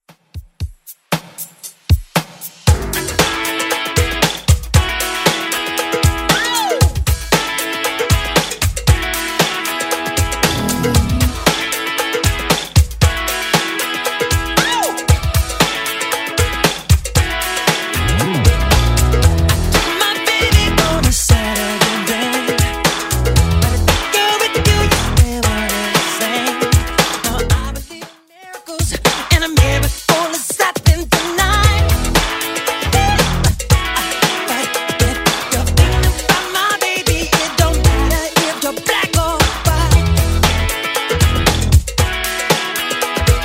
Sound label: 90s Redrum 116bpm